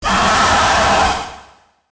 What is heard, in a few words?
monster; easyrpg; WitchsHeart